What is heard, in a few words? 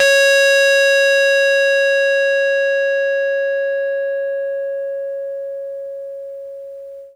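SCARY RHO; RHODES FUZZ; E-MU Producer Series Vol. 8 – Platinum Phatt; EP FX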